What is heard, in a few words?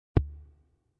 click